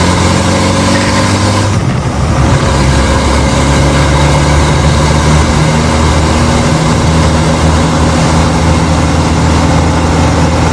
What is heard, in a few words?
fourth cruise, vehicles